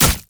fizz basicattack; LoL

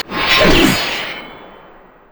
quickdraw; LoL; yasuo base

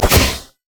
call of the void; LoL; alzahar

